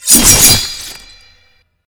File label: long sword attack; roland